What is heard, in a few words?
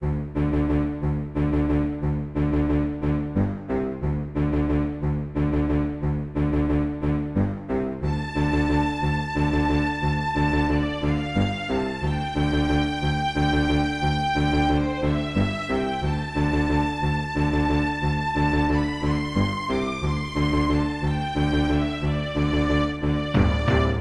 pirate, Music